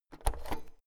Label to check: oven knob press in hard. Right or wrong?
Right